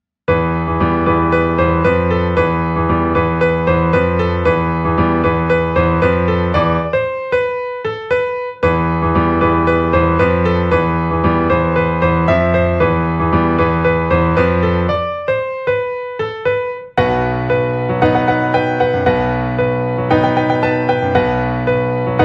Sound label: piano